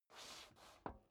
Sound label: step boots metal dry scuff; schritte